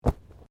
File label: Bodymovement Fall Light; Body movement foley to simulate falls and deaths; Wrestling And Struggling